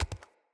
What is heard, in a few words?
Walk Default, Footsteps; Characters; Enemy; Mockeries; Mockery